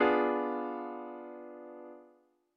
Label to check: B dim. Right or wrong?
Right